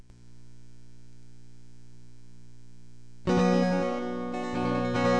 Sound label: asphalt rain, guitar playing